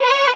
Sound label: vocal Gm; higher; SD AURORA Sample Pack; Slate